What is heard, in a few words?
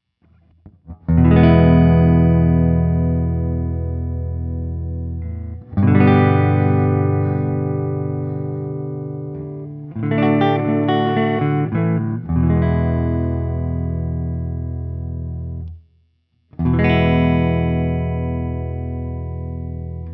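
Guild X700 ODS100 Open, guitar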